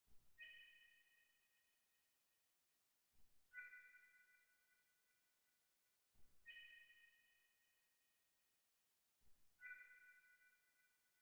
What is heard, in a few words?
158 BPM D# Min High Flute; Loop Stems; Cymatics; Fill Up My Mind